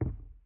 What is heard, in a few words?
Footstep